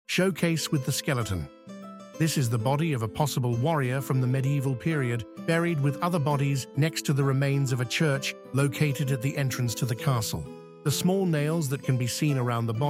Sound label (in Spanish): música; archaeological museum audio guide